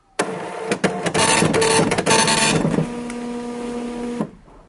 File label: printer